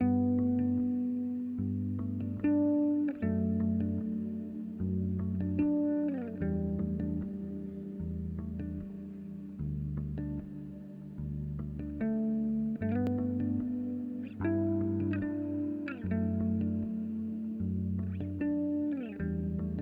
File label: melody and comp, Melody & Accompaniment, electric bass